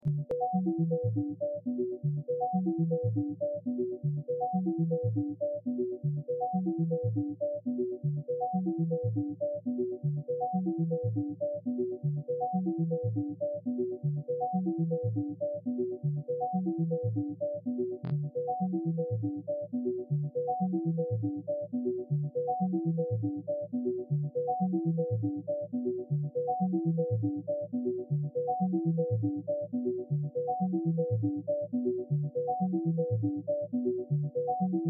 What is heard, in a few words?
music; DelphiArcadeGames; MarsRocket